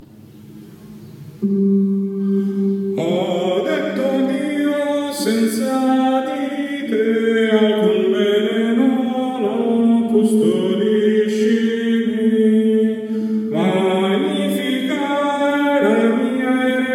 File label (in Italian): Custodiscimi Soprani